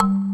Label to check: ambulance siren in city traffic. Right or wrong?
Wrong